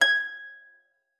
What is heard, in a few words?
G6, harp forte; Xsample VOL-8